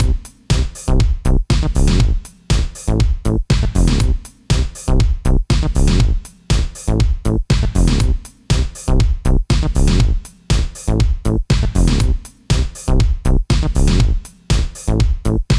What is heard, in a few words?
Chain Comp On Tuned, sidechain compression; sidechain compression in ableton live